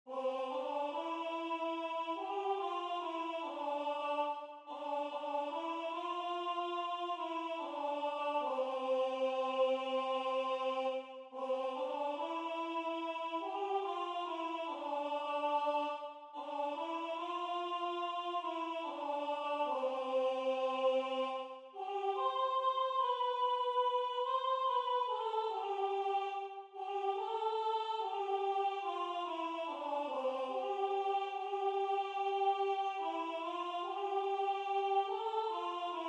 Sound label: Soprano